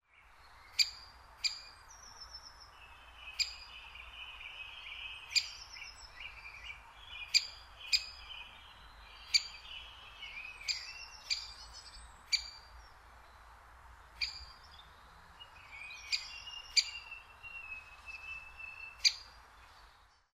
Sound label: great spotted woodpecker